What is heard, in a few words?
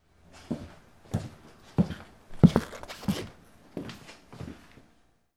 FOOTFALL